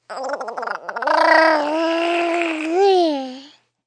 Baby Gurgling, Baby sound, 1 yr., gurgle, sigh, human voice, kid, child, infant; Talking Sound Effects; Sound Effects:Voice; VoiceBox